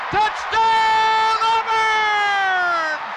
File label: touchdown; auburn